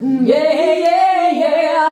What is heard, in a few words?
"HNYEAHI", FEMALES; Best Service; Hyper Dance